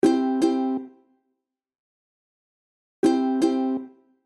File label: ukulele strumming du; ukulele schlagmuster anfaenger lernen